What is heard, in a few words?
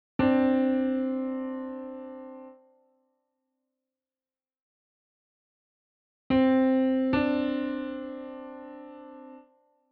interval